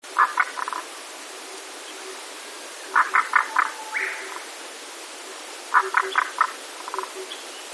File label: crow